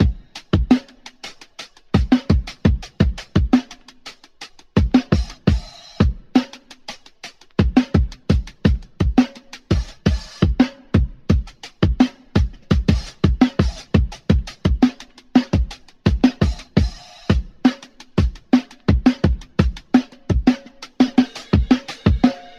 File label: gospel blues, drum loops